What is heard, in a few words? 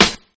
present snare one shot f sharp key, acoustic snare tuned to the F# note